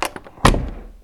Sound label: door close